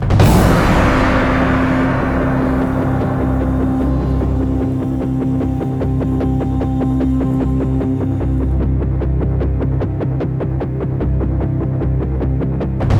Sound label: Chopper Action Start; Scourge Outbreak